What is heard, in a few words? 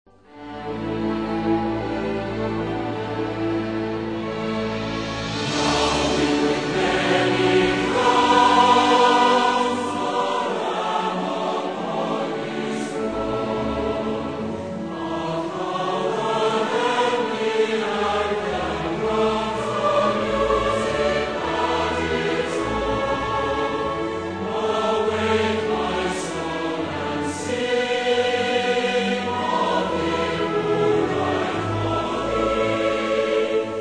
Orchestra and Choir